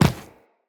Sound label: Infested Medium Land Default, Footsteps